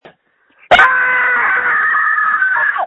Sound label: Scream